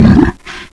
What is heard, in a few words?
heavy dash, zombie; ZombieDarkness